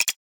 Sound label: decision, button